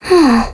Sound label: Alarm Clock; Ashley's